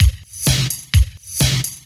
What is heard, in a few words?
Housebeats, 128BPM; Vengeance Samples; VEH2 Loops